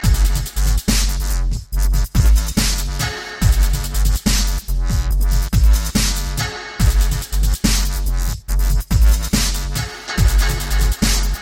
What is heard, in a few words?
DUBSTEP